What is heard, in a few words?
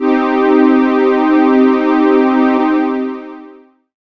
pad, Dance Synths